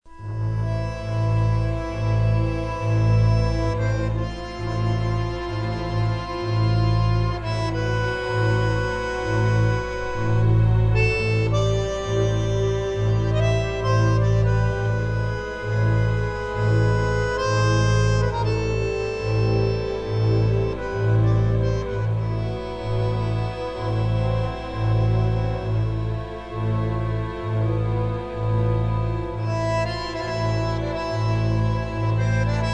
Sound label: Tango